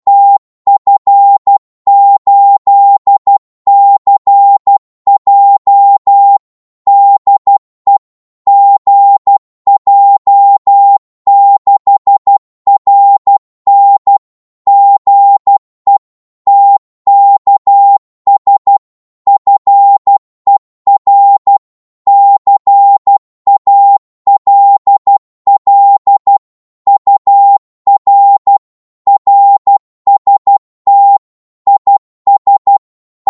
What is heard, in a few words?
qso 12wpm, Morse